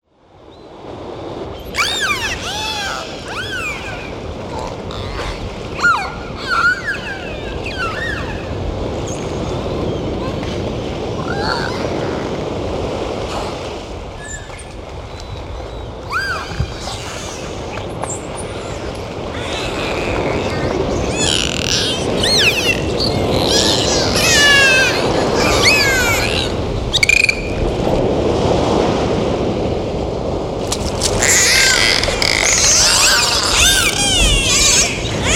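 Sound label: dolphin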